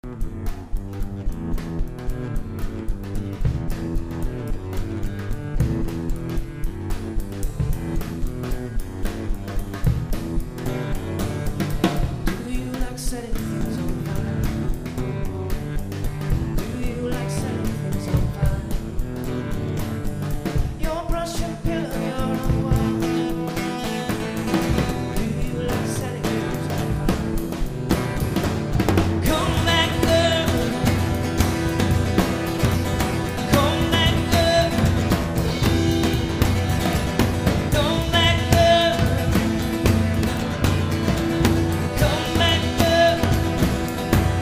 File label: drum